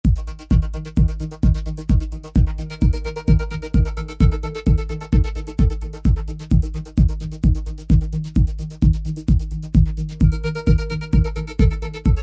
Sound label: Wire Rhythm, synth